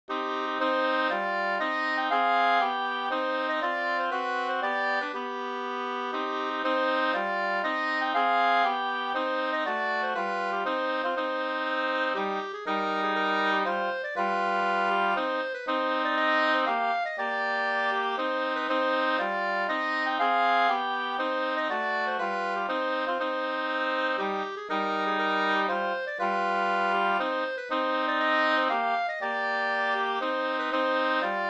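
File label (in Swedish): polska, tvåstämmig; Kalmar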